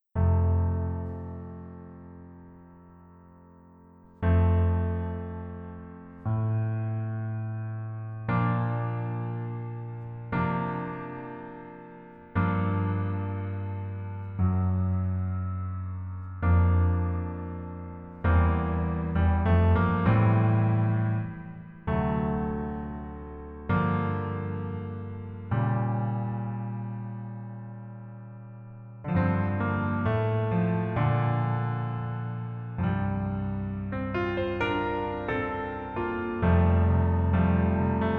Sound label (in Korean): Piano ver 남자 키